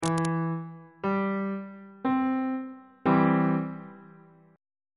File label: b sharp major 1st inversion